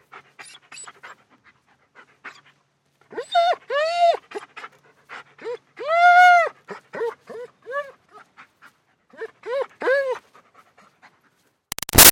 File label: Dog German Shepherd Pants Whines; Animals; Dogs; Big Room Sound